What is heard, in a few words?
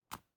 DICE on Cardboard, Grab, Standard, One Die; Samples; ArticulatedSounds; DICE Sfx Party (Extended)